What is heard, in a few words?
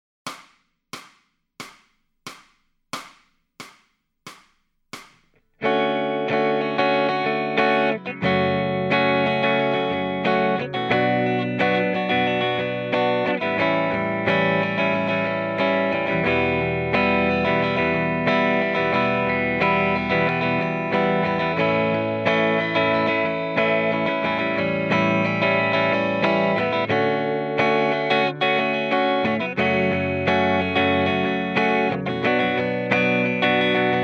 Lead Backing Track, electric guitar